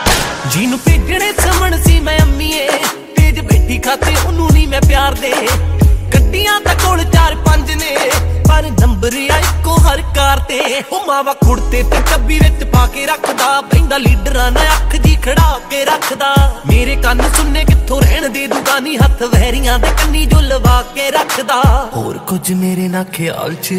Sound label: Punjabi Song Ringtone